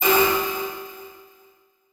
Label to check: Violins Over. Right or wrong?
Wrong